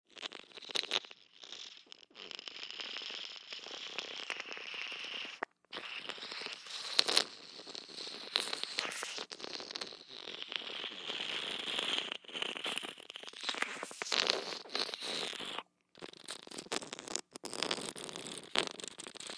HOSPITAL EQUIPMENT DENTIST: Saliva vacuum, suction sounds.